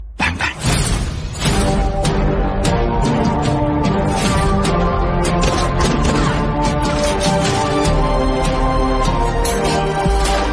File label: Bgm